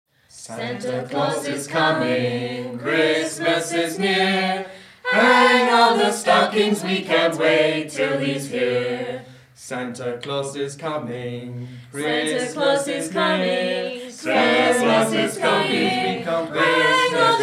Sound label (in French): chant canon, choir christmas carol; christmas carols